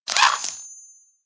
Ice Collision; Angry Birds Space

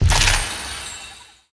Attack Cast; Dumbledore

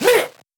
Wizard Boost

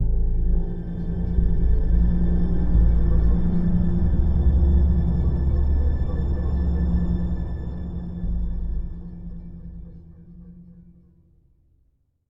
Ominous; the walking dead michonne 3 what we deserve

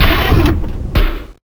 Factory Boss Chest Open

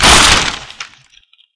Chest Sound

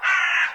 Crow

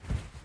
Cloth Walk

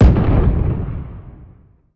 Fireworks Blast; Angry Birds Seasons

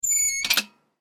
Unlock Safe; Granny 3; Android

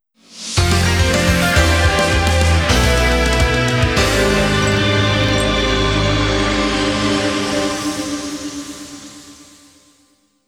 Game Over Jingle; tokimeki memorial 2

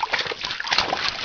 Water Steps; fireboy and watergirl

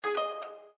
Calligraphy Pop-up Blocked, windows 7

